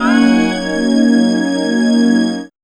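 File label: strings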